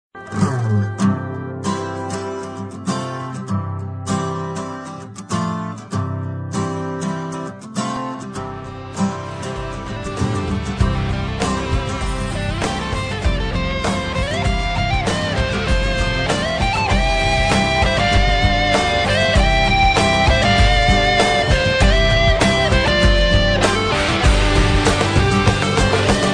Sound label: Guiter; 3 Idiots